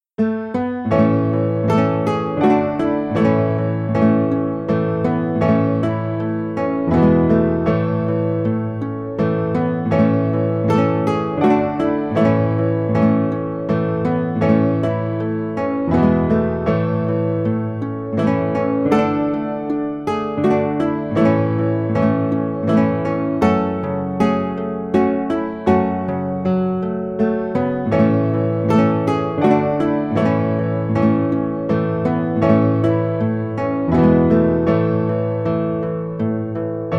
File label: Guitar